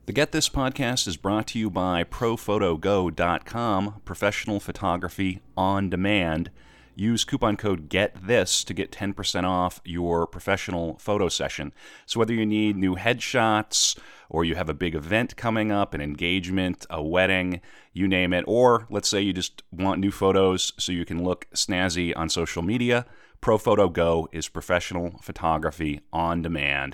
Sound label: jazz glockenspiel